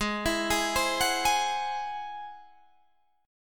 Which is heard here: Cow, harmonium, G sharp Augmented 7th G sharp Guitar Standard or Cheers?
G sharp Augmented 7th G sharp Guitar Standard